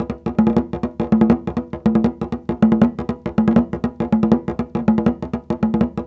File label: 160 GHANA, African Ethnic Drums; Spectrasonics; Supreme Beats